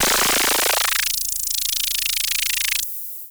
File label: Yamaha CS5, Filtered Noise; noise hiss crackle